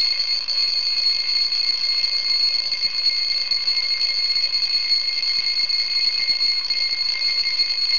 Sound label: Unit Alarm